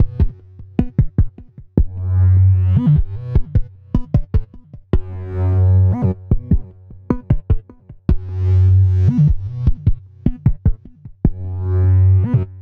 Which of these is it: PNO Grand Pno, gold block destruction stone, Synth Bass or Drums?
Synth Bass